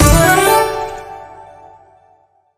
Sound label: freespin win; Racetrack Riches; megaboard